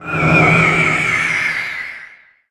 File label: door; Shin Megami Tensei IV Apocalypse; 3DS